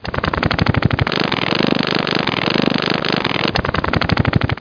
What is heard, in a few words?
short flap